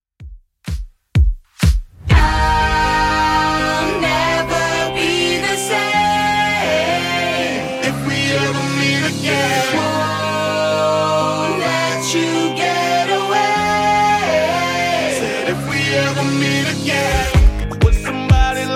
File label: 2ks Redrum 126bpm